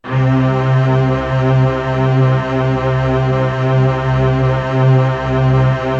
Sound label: str c3, YAMAHA TX16W Orinoco Strings